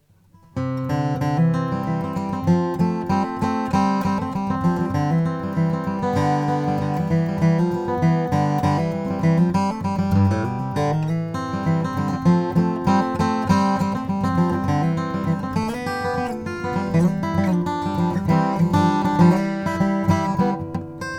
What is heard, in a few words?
martin d 18 custom shop mahogany